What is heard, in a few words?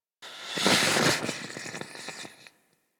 Goop Thick Burst; Ambience; Misc Outdoor; Warframe; Infested Eris